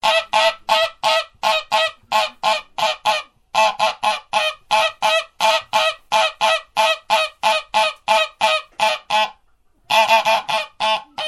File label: GOOSE REEK SOUND